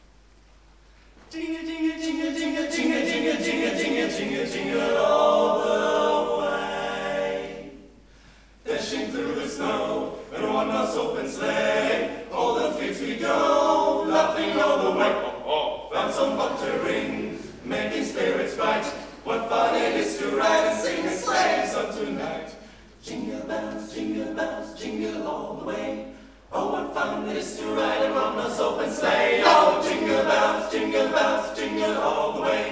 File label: Jingle Bells, Jugendkammerchor